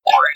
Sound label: banana bounce, backwards; css